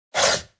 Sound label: Piece sliding on wooden board game